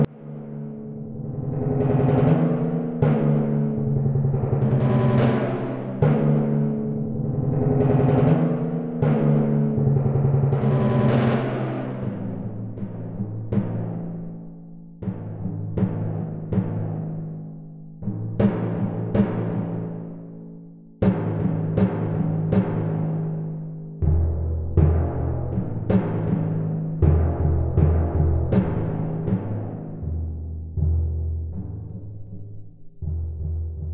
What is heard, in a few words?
Symphony in Raga Ragupriya